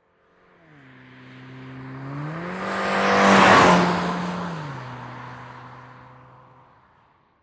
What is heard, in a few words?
Transportation Cars Honda Civic Drive By Very Fast